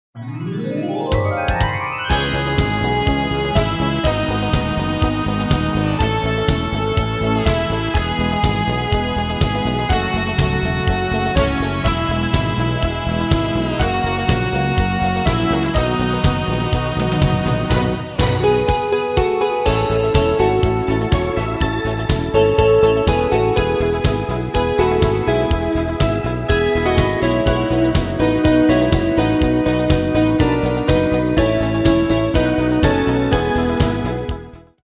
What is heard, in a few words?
Happy Guitar Orchester